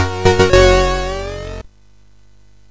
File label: Tube, MOS6581R4